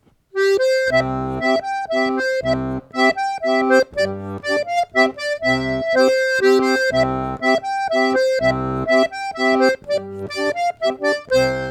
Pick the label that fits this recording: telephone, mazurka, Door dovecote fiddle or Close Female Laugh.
mazurka